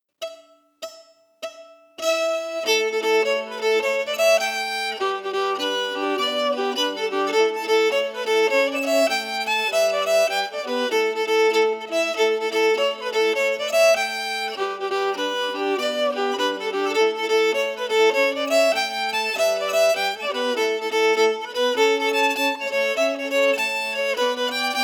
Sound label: The Weaver melody emphasized